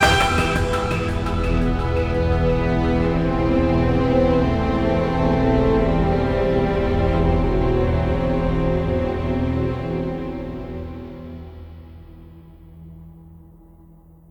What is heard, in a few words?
Generic Ambient Combat End; The Darkness 2 gamerip 2012